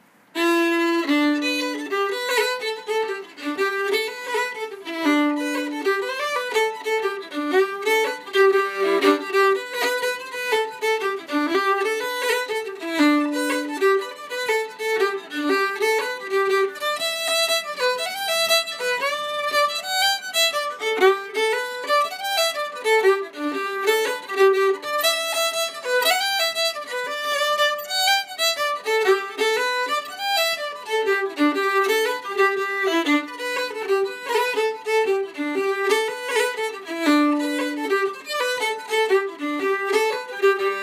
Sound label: Out On The Ocean Jig Normal Speed